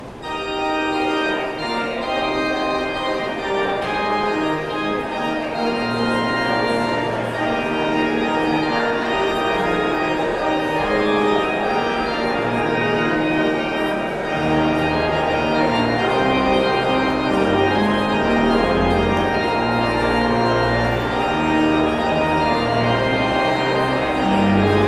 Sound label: Postlude